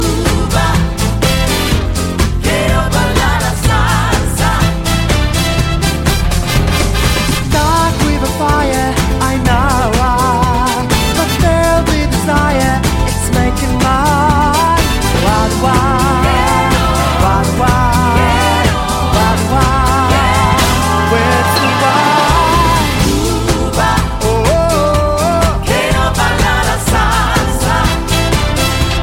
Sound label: cuban b, reggae